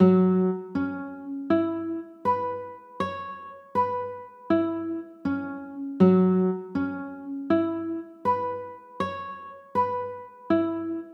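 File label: G flat sus4 chord, guitar; g flat 7sus4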